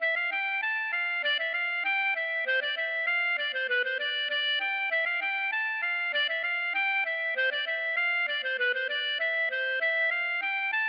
scottish Valse

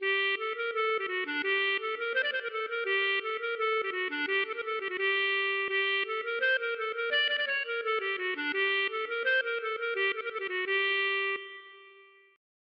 bourree; Il Est Bien Temps

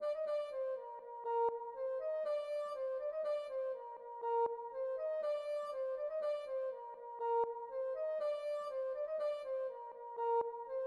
rond Landeda Trad

